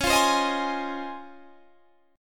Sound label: Db Major 9th Db down; strummed; JGuitar